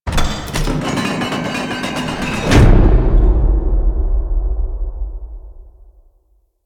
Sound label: Dungeon Prison Door; Warframe; Duviri